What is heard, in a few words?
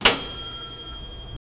toaster oven done cooking; appliances